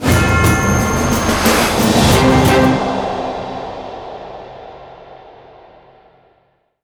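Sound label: Music Ambience GameOver Stinger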